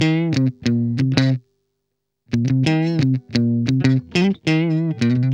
GUI 90 LICKS

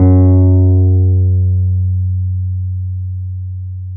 PNO, Wurly

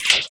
RSS, 160 BPM; Ueberschall; Drum & Bass Flash Trackz

